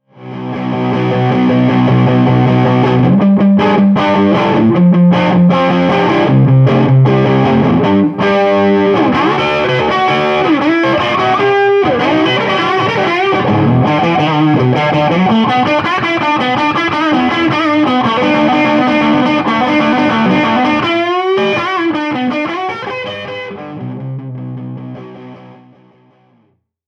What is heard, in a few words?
les paul clean high gain fat